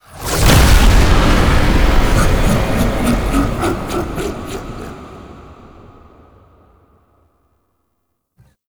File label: Spawn Stinger; Enemies; Warframe; Entrati Doppelganger